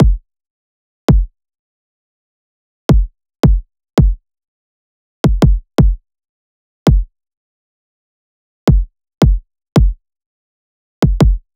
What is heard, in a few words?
kick loop 83; Pop; Drums; Kick Loops; banbury; Slate; SD PASADENA Pop Sample Pack